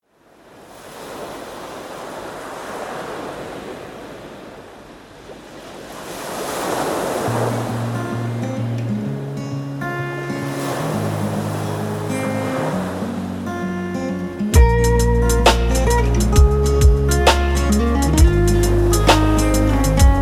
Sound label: no place like home instrumental